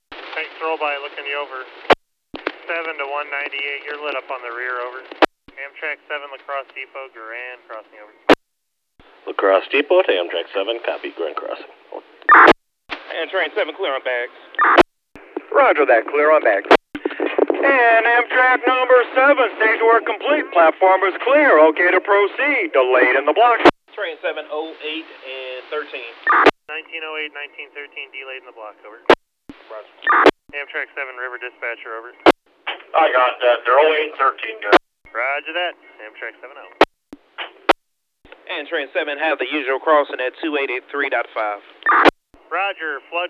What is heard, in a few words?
Amtrak